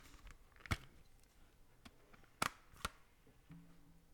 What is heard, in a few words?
Opening Package